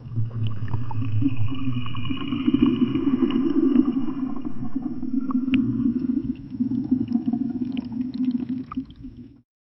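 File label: sustained underwater ambience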